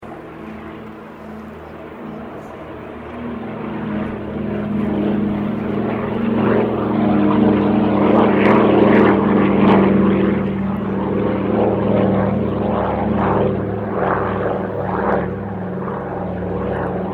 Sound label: aircraft douglas dc3 pass; 1942